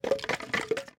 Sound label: bone pile movement